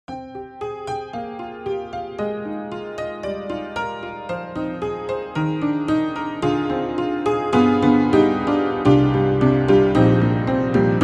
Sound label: Barroom Upright; Upright Pianos; Synthogy; Ivory II; Balled Barroom Upright II